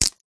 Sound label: foley stick twig snap